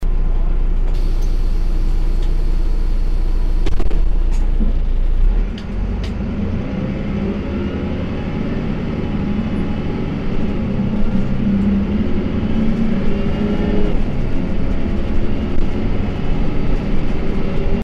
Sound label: bus departure inside